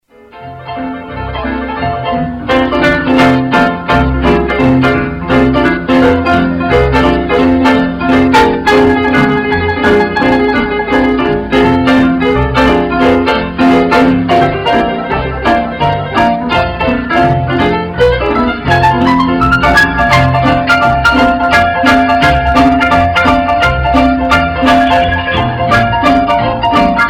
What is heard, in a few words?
piano boogie